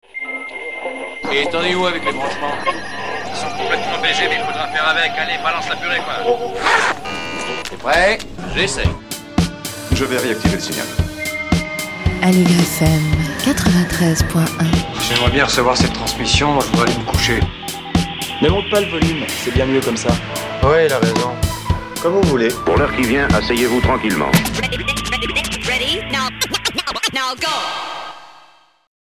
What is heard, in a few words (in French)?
jingle